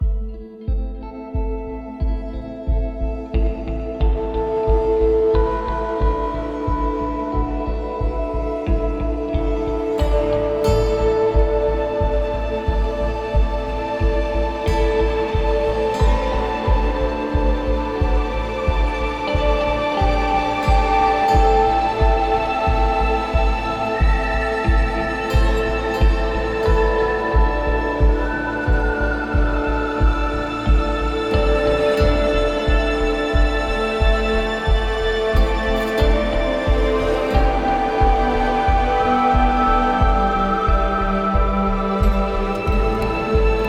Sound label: Day Ambient Loop; Route99